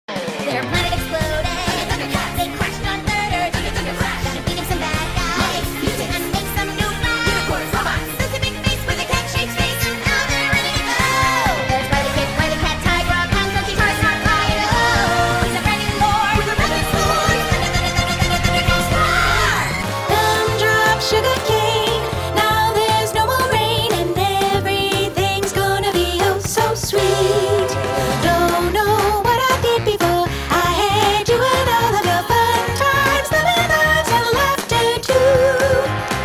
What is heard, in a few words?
Character Singing